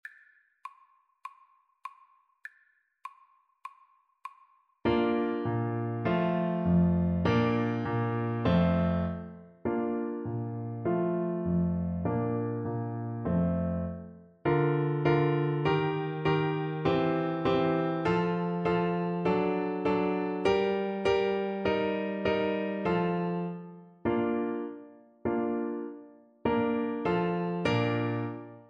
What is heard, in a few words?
Clarinet